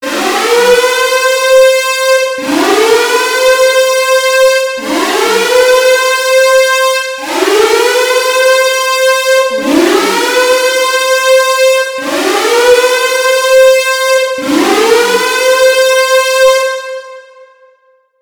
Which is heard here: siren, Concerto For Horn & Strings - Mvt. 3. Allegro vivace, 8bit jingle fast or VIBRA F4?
siren